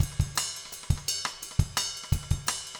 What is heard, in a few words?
jungle 170